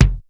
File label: BD HIDRY, bass drum; BASSDRUMS